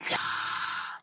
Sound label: idle, evocation illager; PureBDcraft; minecraft